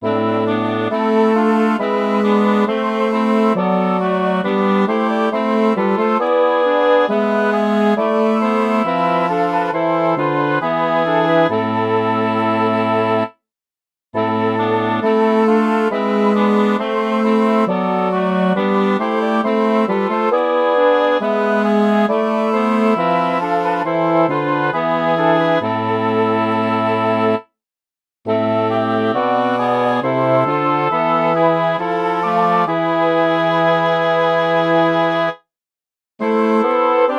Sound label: for woodwind quartet; bach johann sebastian; chorale du bist ein geist der lehret